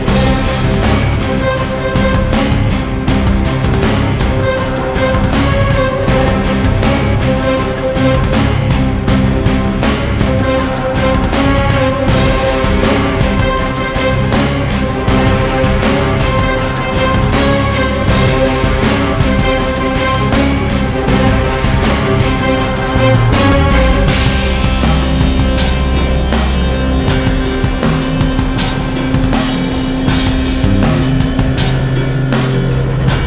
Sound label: theme, music combat